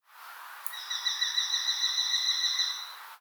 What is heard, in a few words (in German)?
Sperber, birds